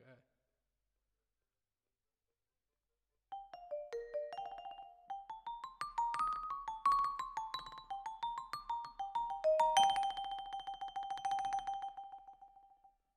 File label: Vibra Close